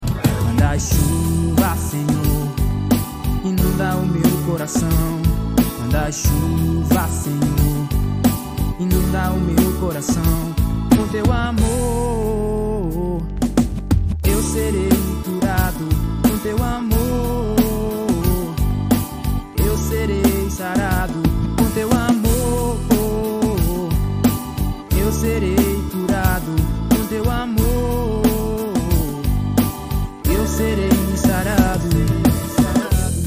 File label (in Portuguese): musica gospel